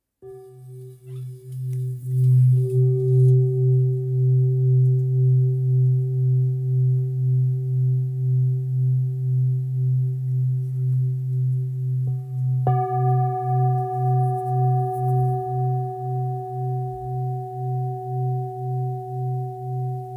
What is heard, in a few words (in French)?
Bol bengale do